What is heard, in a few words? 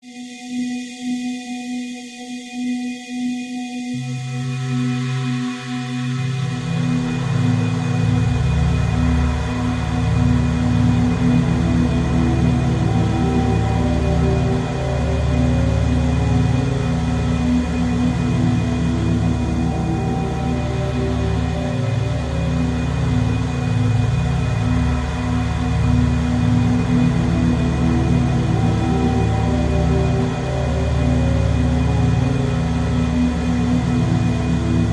Dream Train Low Pulses with High Scrapes Deep Ambie; hollow